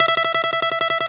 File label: BALALAIKA, Prima Balalaika, Plucked Strings